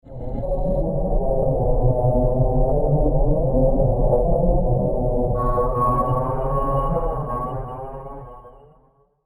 Star Fleet Hangar, spacecraft sound effect; science fiction sound effect

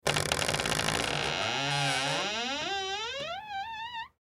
Door Creak Squeak, Door Creak Squeak Slow Eerie; Creaky Doors; Household; Blastwave

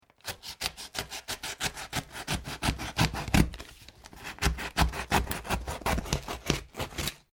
Cardboard saw; Material components for constructing more complex sounds; Materials

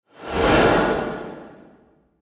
Whoosh Fast Scrape, Transition; Production Element, Imaging Element, Accent; Whoosh Sound Effects; BlastWave

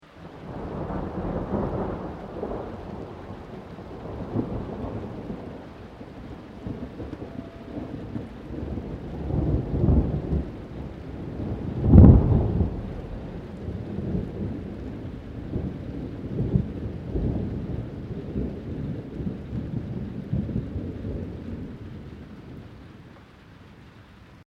THUNDER; Elemental weather effects; Thunder (Sound Effects:Weather)

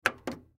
Television Push Power Button; Televisions; Electronics; Technology; Blastwave

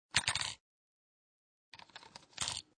Foley Insert Batteries Into Battery Compartment

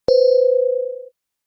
Tap Error, tapping

